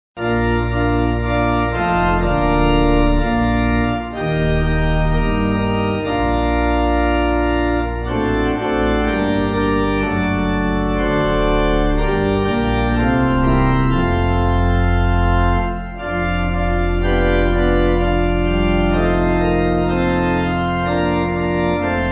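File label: Pipe, Pipe Organ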